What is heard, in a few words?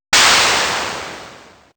Drum, Drum One Shots; AMG - Coldcut's Kleptomania VOL-1